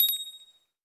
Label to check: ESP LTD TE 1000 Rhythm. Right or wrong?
Wrong